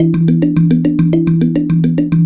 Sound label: Marimba; Sonic Foundry (Sony Creative Software); World Pop; Percussion